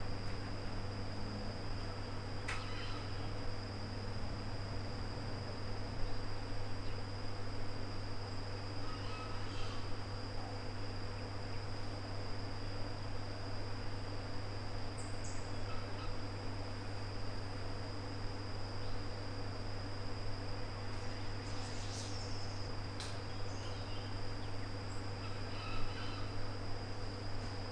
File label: outdoors; outdoors pack